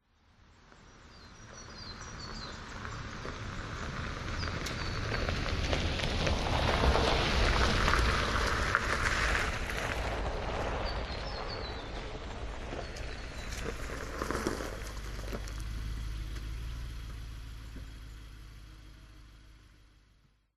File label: car suv approach slow circle microphone gravel grit birds nissan patrol; vehicles